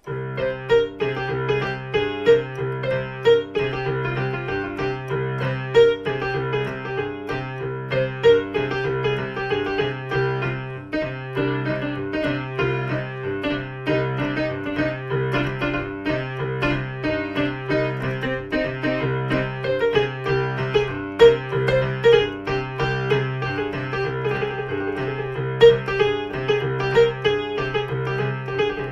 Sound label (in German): klavierstueck; opus 204